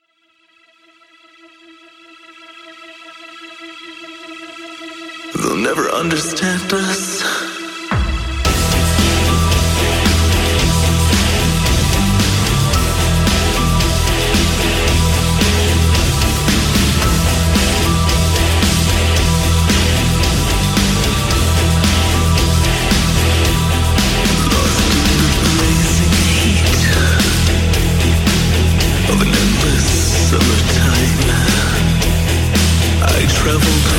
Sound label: Remix